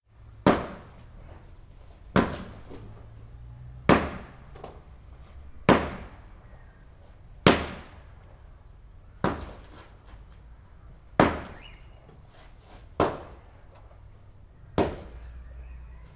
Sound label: Crushing Concrete With Hammer